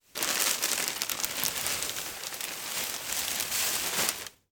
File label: Misc Mvmt of Garbage Bags